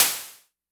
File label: Crashes; LIMAT Div Dept; Drums